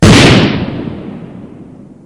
Target Explosion; MilkChoco

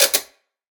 Laundry Machine Switch On; Prison Architect

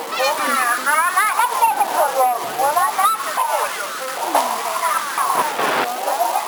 Loop; Perksacola Loop; Call of Duty Black Ops II; PS3; Wii U; Xbox 360

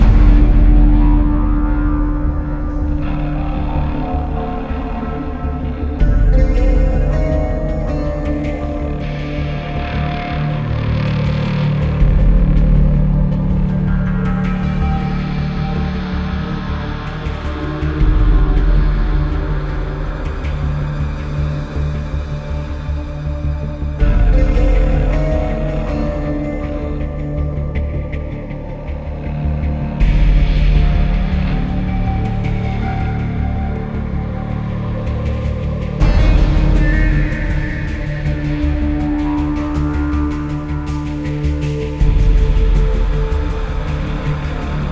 Battle Low; bulletstorm ost; ps3 ps4 switch windows xbox 360 xbox one

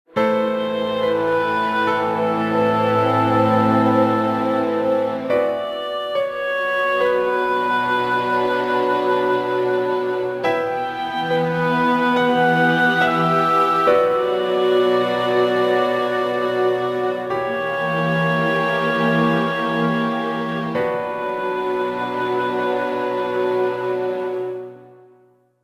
jingle flashback; Haunted

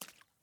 water step

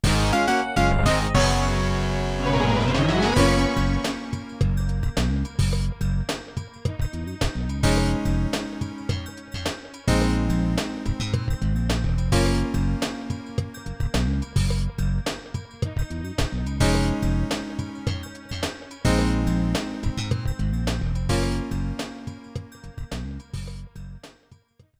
Goal; wave race 64 n64 gamerip 1996

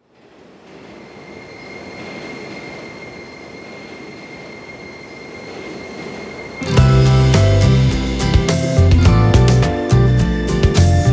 Loop; Densha de Go; arcade

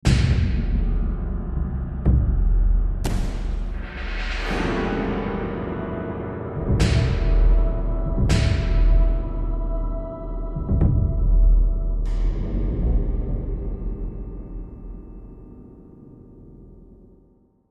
Tension

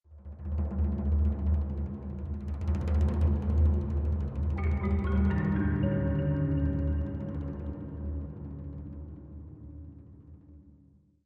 Woods Front

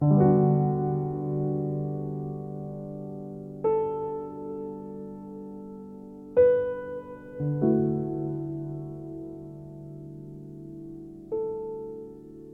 Mood Farewell Medium

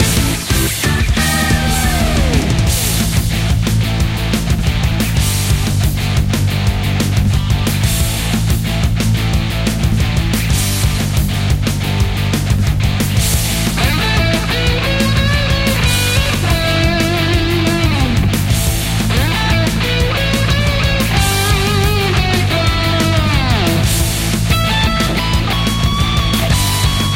Landing Normal; Fishing Strike; Android iOS gamerip 2018

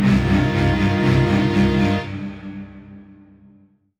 Dramatic Event Bosque

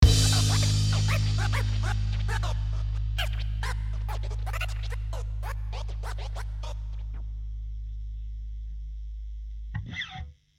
Rhythm; PixelJunk Shooter 2; PS3

